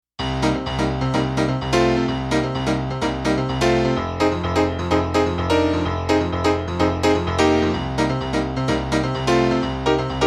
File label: piano; rd-800 demo song jd